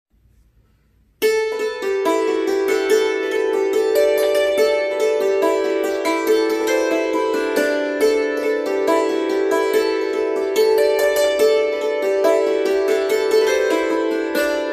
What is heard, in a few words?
Ballydesmond Polka